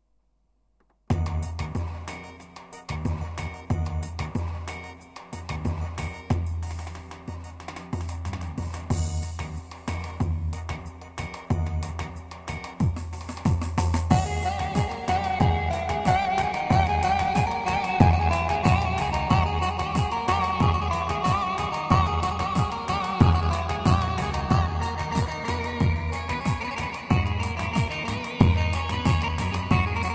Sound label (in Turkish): pa-500 tr dogu elektro